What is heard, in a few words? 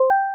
reconnected